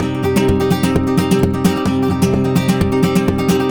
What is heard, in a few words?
130 C Rumba; Spanish And Gypsy Traditions; Soundscan vol.59; AKAI